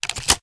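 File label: shotgun reload loop shell in; reloads; iw8; romeo870; gmod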